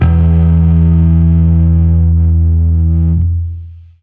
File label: Fuzz E harm